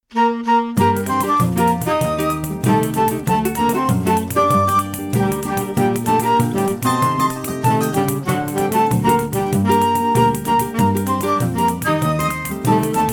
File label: songbook instrumental